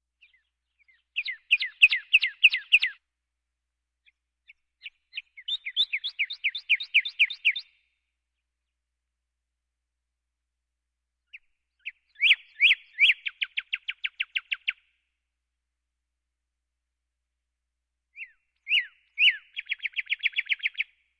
Birds

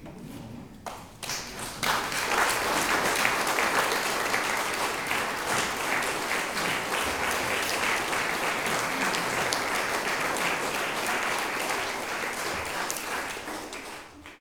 Applause; best service Blue Box

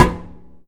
pebble door; Apotheorasis

